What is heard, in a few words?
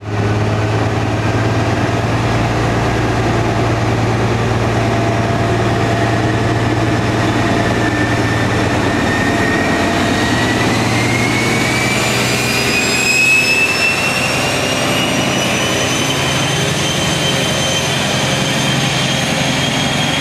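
hst valenta, train; sans pareil; simutrans; Pak128.Britain-Ex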